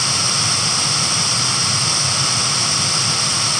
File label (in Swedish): Brus; sverigejakten